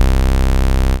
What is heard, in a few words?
Sync Bass; Big Fish Audio; Old Skool House; OSH Bass